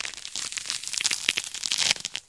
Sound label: Grapefruit Tear; gore stabs & eat